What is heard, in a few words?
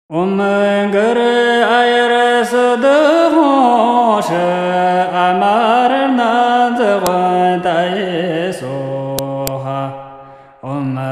Long life Buddha mantra